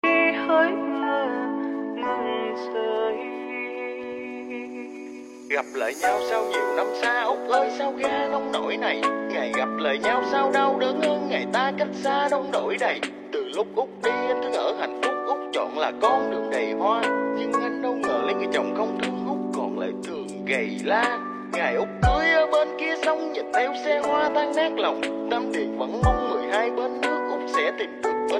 Lofi TikTok

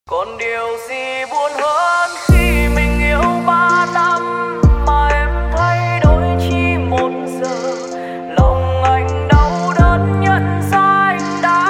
Lofi

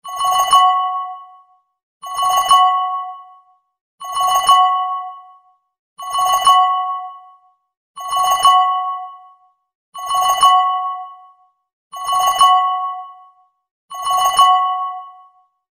phone funny bell

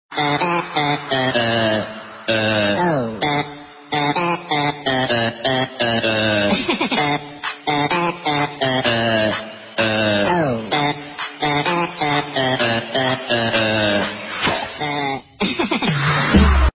electronic minion remix

